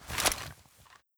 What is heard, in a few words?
gravgun deploy; physcanon